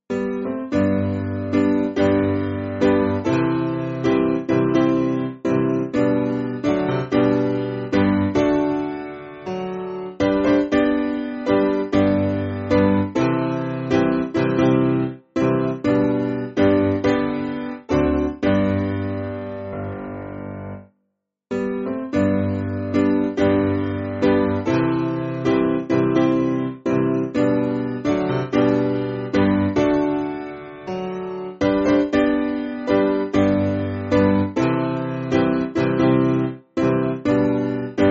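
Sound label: Piano, Music Backing Files for Churches; Maitland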